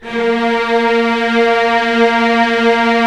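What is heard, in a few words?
string section; Roland - LA Composer vol. 1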